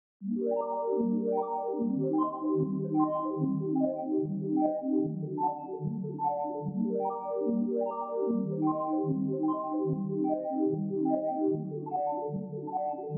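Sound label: Arp 148BPM G minor Trippy Wavy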